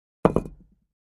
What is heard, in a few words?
Cinder Block Two Slam